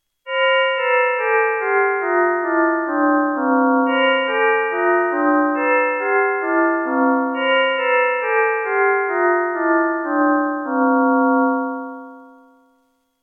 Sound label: bell; Schumann